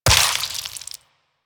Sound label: Vial Viral Shatter; Warframe; Alchemist